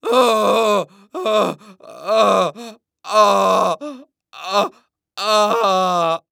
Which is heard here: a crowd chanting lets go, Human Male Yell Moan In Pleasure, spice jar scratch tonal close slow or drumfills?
Human Male Yell Moan In Pleasure